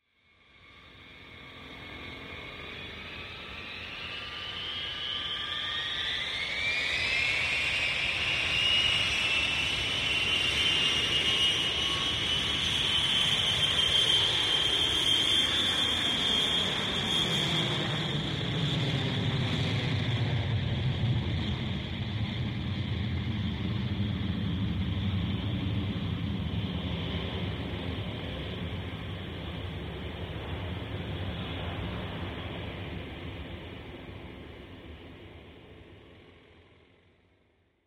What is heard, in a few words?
707 Take Off; SOUND EFFECTS1